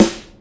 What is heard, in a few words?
Rich Low End Boom Bap Hip Hop Snare Drum D Key